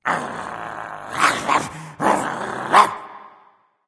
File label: wolf; fenrir